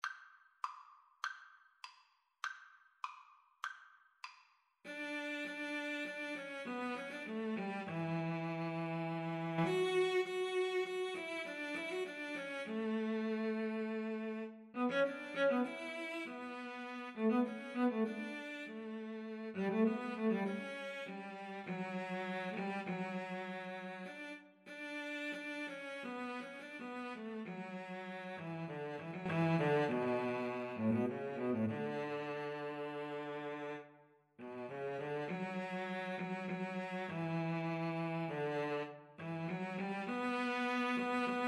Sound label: cello duet